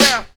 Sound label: SD VOX; E-Lab; X Static Goldmine 2; akai